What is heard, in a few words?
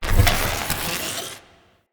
Galvanic Zapper Warm Up